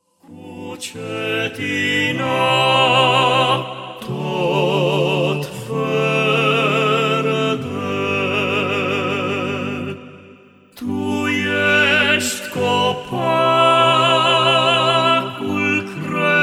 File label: Voice Quartet; O, brad frumos; craciun